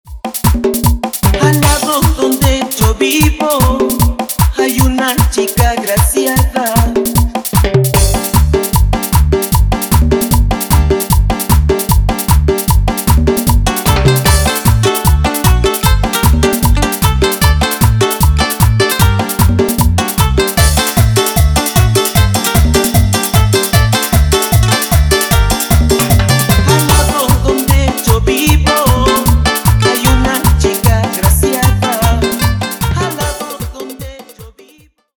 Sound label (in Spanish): COVER NACIONAL BPM 152; DIMAX DJ MIX